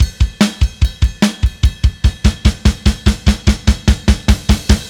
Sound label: Silos Beat Fill, Indie Rock; Sonic Foundry (Sony Creative Software); Indie Rock Anthems; Session 11 - Silos